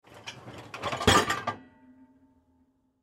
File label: Dishwasher In, Dishwasher Push In Rack; Whirlpool Appliance; Household; Appliances; Blastwave